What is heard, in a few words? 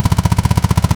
two stroke karting engine